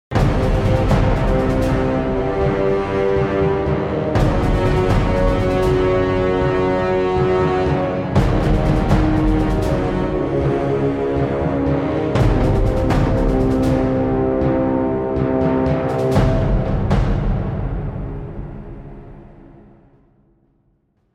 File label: Battlemusic Intense; Age of Chivalry; Windows